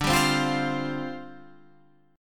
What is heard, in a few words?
F Suspended 2nd D; Fsus2/D strummed